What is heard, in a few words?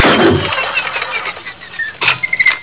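broken door; openffa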